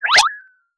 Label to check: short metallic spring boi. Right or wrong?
Right